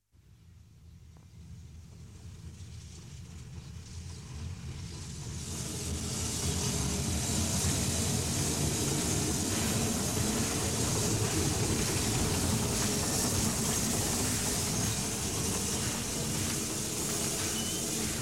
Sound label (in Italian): METROPOLITANA ARRIVO